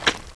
grapple impact, weapons; svencoop

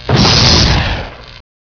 flyball hit; gearbox; flier

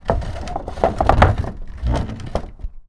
wheel, buttons; svencoop

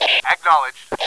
strike

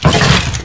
wood; gearbox; debris

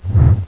claw miss; svencoop; panther

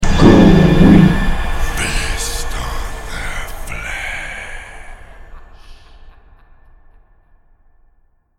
Zombie win; cstrike

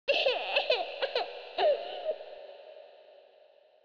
kid cry

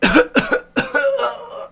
poison pain; wizardwars

